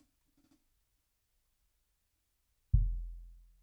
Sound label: BD Out